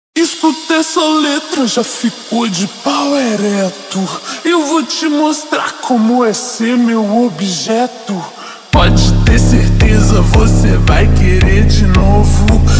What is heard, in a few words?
slowed and reverb